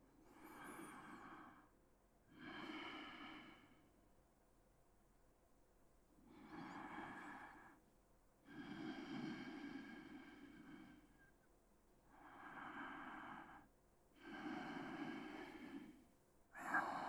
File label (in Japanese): 寝息声; 哄睡; 監禁契約～やさしい調教性活のススメ～